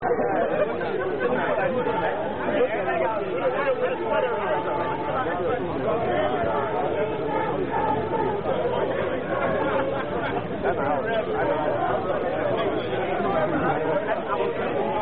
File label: Alpha Kappa Alpha Hymn; Sfl Aka Founders Day Bbq